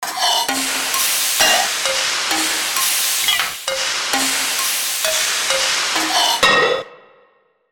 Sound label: SAUCEPAN; eShop